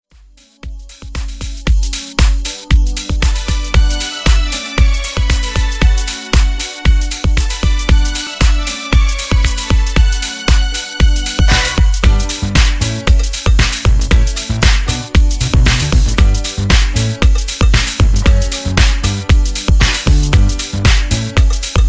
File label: Funk Redrum 116Bpm